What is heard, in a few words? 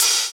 Open Hhat, Hihat; Equipped Music; Loungin House